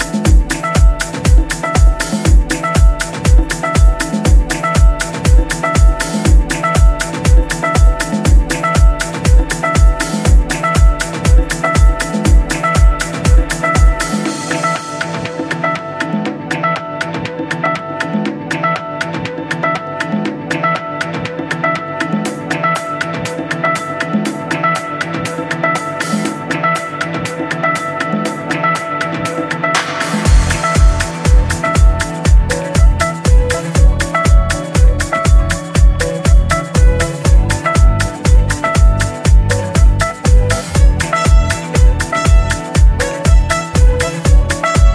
Instrumental Remix

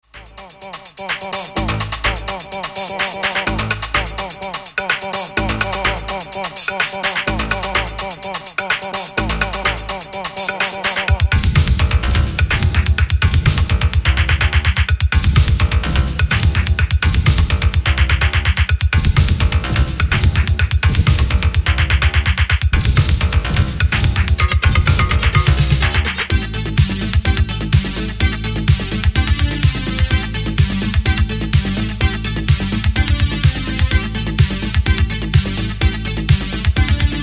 Computer Break

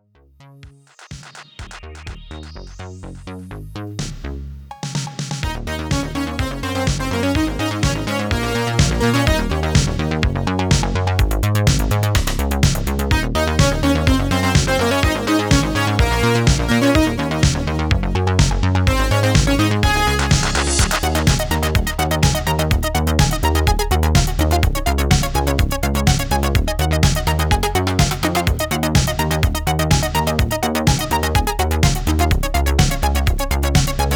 Instrumental Dub

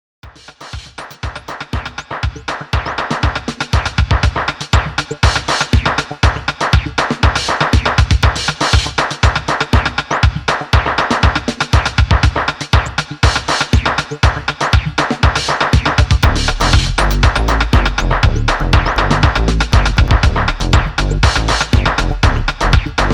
blues

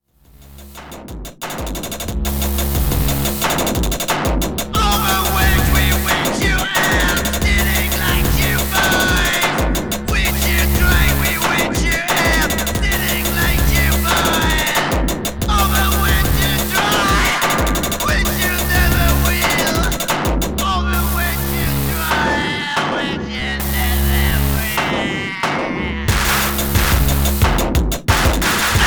Techno

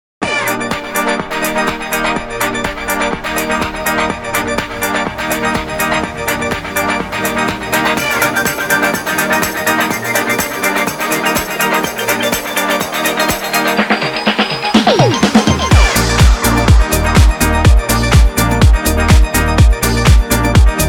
Disco

